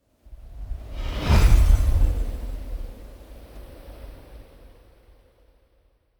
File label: magic spell wind shimmer; swooshes-swishes